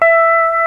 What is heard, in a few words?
PEDAL STEEL